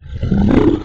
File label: mount; sfgame; mounts